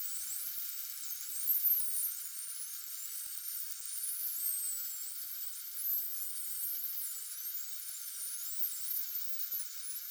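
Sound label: Common Dolphin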